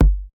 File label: old school smooth kick one shot g key; kick drum samples